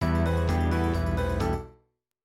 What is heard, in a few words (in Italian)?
anni '80 revisited